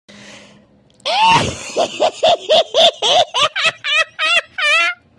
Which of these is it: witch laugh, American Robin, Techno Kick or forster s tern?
witch laugh